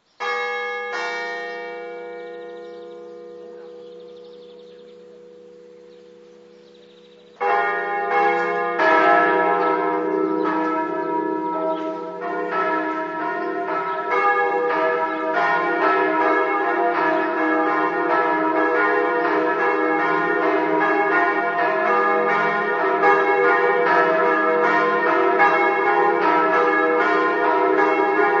fontfred, campane

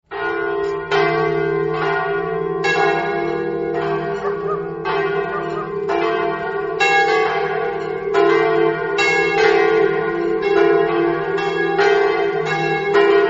braulins, campane

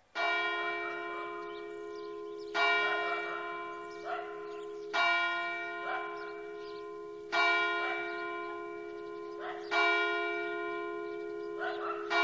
cordovado, campane

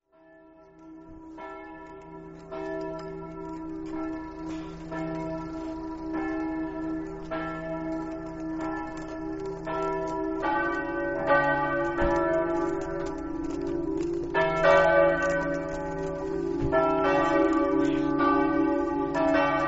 gorto, campane

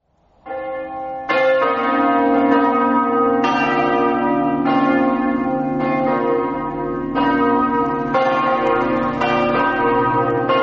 campane, Lestizza

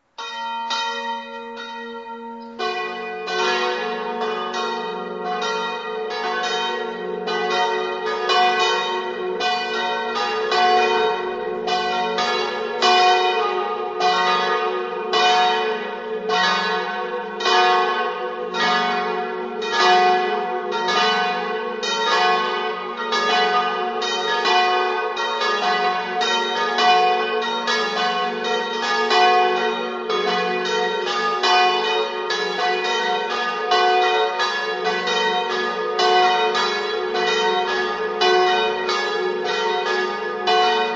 rauscedo, campane

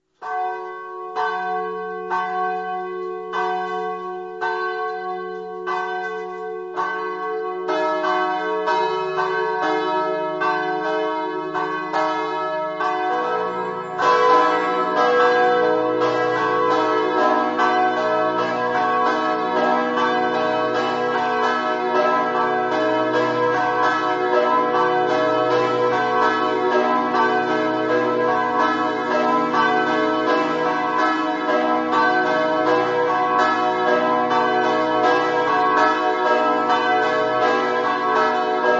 tolmin, campane

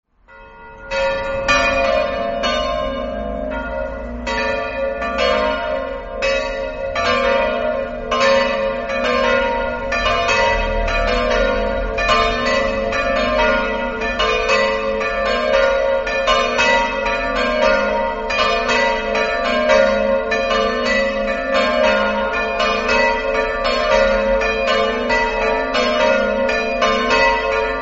sottoselva, campane

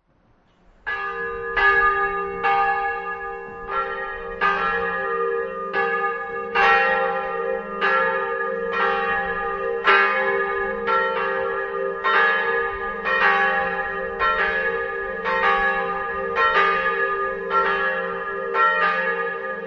avoltri, campane

